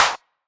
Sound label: EDM Future Clap; Big EDM; Future House Tools; Claps